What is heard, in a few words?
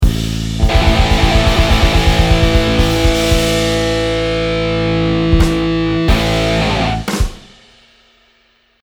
ending phrase, guitar